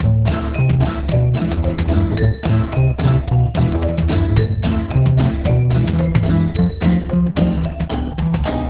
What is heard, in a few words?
music